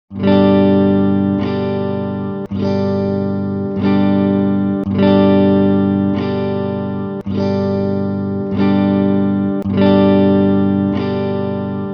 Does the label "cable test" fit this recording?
Yes